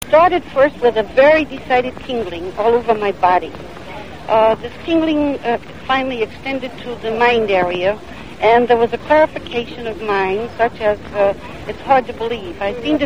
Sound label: UFO contracting; incidentals